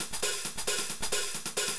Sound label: 134 Techno Hats; Dance DJ; Drums